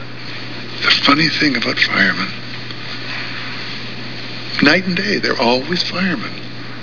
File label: backdraft